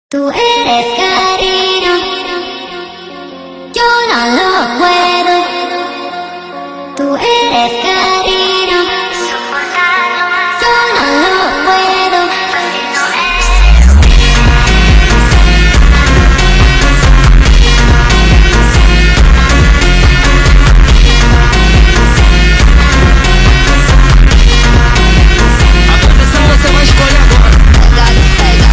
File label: Funk sped Up